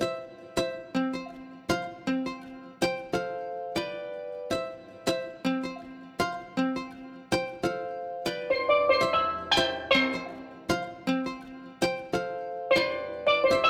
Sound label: Happy; Pbff Music#47 (Bgm Str 02 Happy)